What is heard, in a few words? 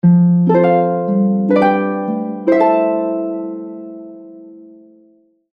Ladder Ringtone, Harp; Harp 1